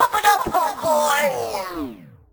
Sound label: Vocal Phrases; Vengeance Samples; Vocals